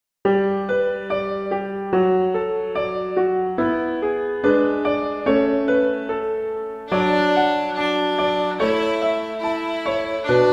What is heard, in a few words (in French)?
Promenade onirique, Violon et Piano